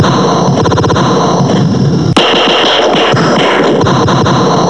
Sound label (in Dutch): explosie; MEISJE